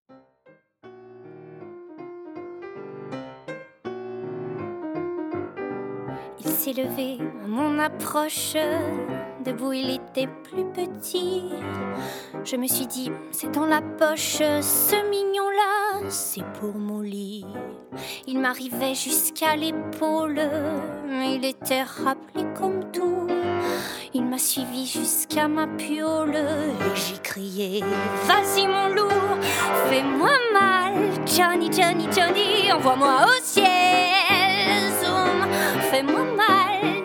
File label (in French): chant